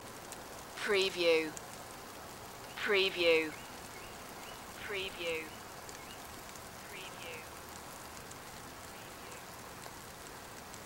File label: light rain with bird loop